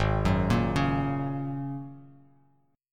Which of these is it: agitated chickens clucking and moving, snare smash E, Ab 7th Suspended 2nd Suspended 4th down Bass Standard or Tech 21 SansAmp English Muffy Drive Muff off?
Ab 7th Suspended 2nd Suspended 4th down Bass Standard